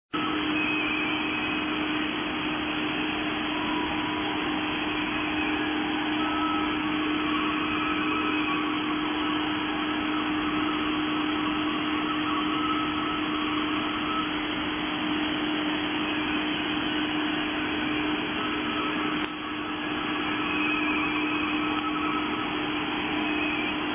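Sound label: cq ww radioteletype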